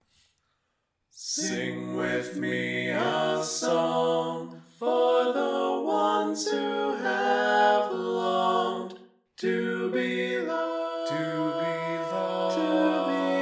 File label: Bass, barbershop tag